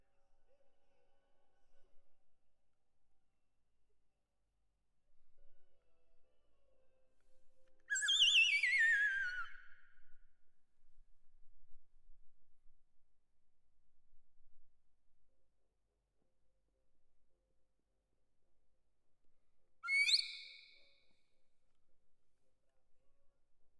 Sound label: Perc Close